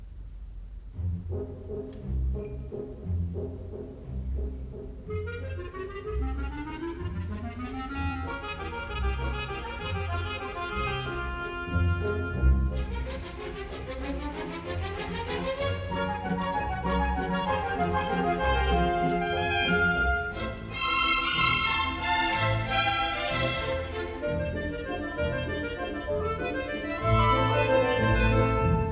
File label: Waltz